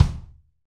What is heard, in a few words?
Rock Kick; Roland BK-5